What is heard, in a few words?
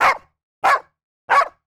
Dog Barking; Animals